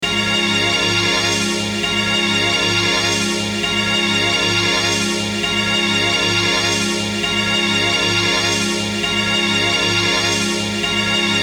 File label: Groove